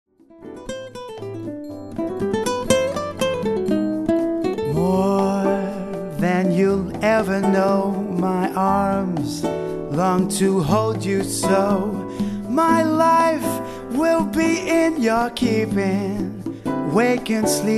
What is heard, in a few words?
jazz